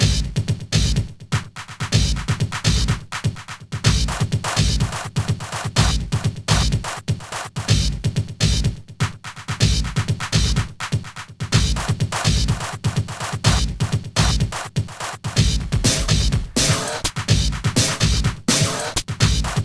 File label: drum ride